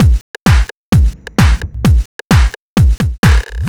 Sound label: No Hats, Drum Loops 130bpm; Zero-G; Soundclash Nu Dance Electro; Acidized Wavs; Straight Laced